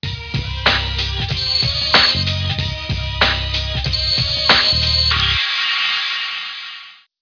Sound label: Shutdown; Windows Never Released Soundpack 1